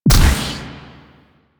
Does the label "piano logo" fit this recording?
No